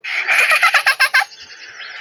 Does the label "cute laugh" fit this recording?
Yes